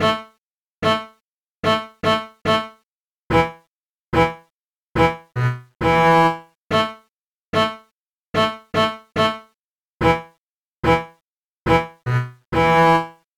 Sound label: contrabass